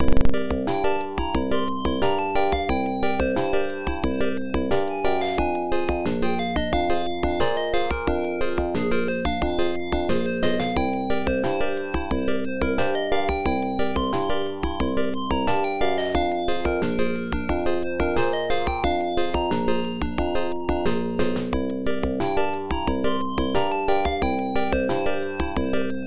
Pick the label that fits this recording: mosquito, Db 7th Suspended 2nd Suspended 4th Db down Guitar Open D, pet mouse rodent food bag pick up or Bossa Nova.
Bossa Nova